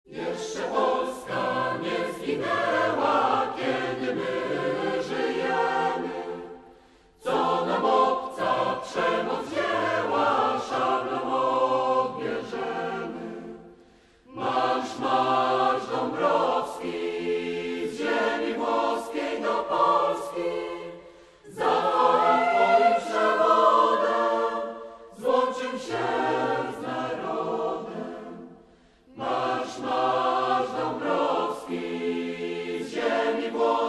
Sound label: Hymn Polski